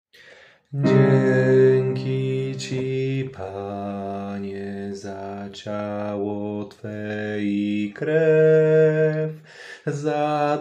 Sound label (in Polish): Bas; nagrania glosow